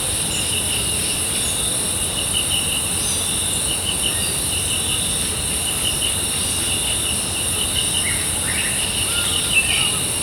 Rain Sounds of Nature Relaxation Binaural Beats Brain Waves Isochronic Tones; Lush Rain